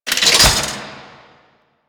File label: Mode Start Interact; Warframe; Nineteeen Ninety Nine; PvPvE